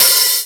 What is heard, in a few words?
open hi hat sound e key; Duration 0:01; Bitdepth 24; Channels Mono